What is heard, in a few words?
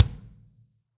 Kick Plastic Low Ambient Loud Punch; Drums; Drum Hits; Standard Hits; Ableton; Session Drums Studio